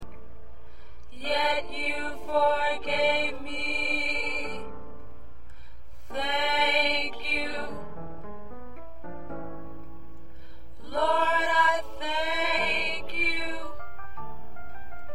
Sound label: Alto